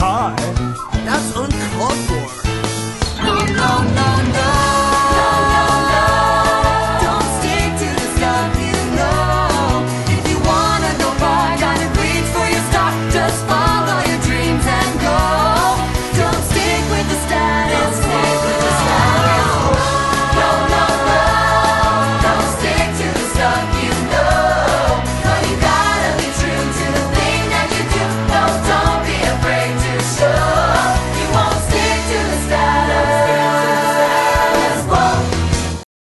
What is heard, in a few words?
guide vocals